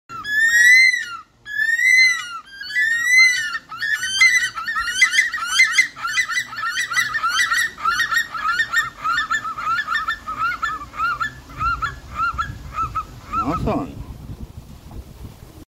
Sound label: Great stone curlew; چاخ لق هندی